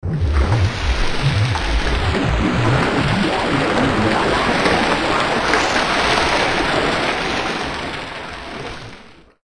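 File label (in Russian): звук воды магия; multfilm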